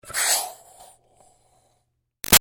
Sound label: Steam Release Iron Chuff Doppler; Miscellaneous Household Sound Effects; BigRoomSound